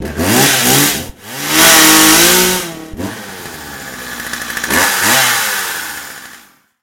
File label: Chainsaw Door Destroy; Game rip; MiSide